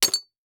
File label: Impact Ceramic Debris Hit By Wooden Stick